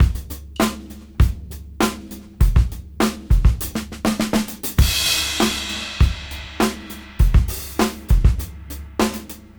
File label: Old School 101 bpm; AMG - Keith LeBlanc Phat Butt Jams